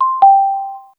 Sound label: a soft single chime sound; event